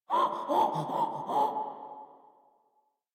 Infested Runner Breathe Quick; Warframe; Runners

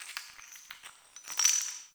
Cave Ocean Rock Crumble; Midrath Island

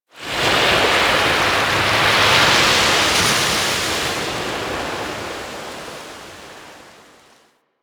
Beach Wave Crash; Ambience; Warframe; Grineer Beach

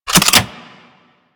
Archwing Sword Unequip; Warframe; Weapons

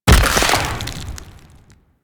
Bullet Jump Ice; Parkour; Mods

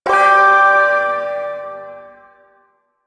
Ersatz Shawzin Chromatic Chord; Warframe; Duviri Ersatz Shawzin

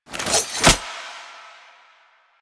Idle Sword Grab; Arthur Hub; Warframe; NineteenNinetyNine

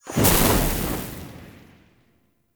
Energy Spike Border Start; Powers

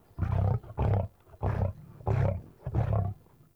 Wolf Growl Run; Animals

